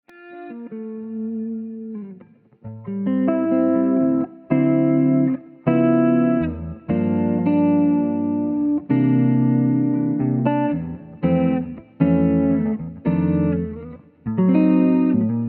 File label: chorus, guitar